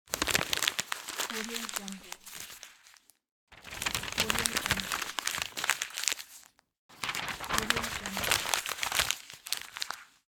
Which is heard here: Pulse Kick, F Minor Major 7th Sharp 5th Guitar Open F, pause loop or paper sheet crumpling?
paper sheet crumpling